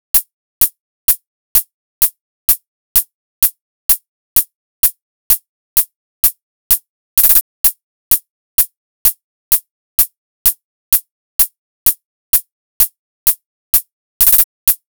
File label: HAT 128 C3